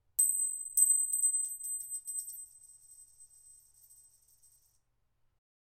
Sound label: Metal Ring Being Dropped